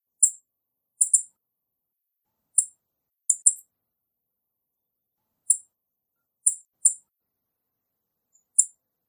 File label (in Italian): tordo bottaccio